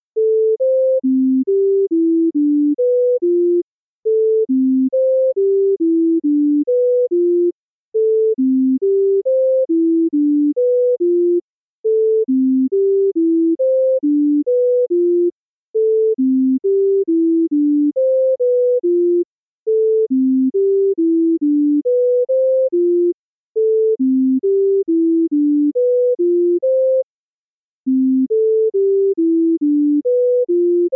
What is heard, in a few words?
bubble sort audio c major